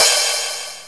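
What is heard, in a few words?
crash cym, crash cymbal; Star Trek demo; Star Trek Audio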